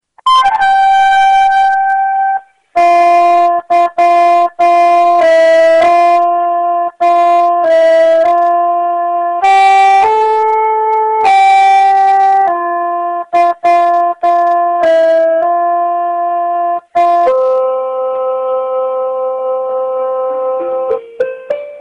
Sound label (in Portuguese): acerto teclado; instrumentos